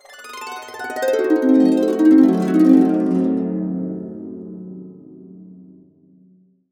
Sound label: C DIM7 CA DN, CASCADE DN, Complete Harps; USB Soundscan vol.62; AKAI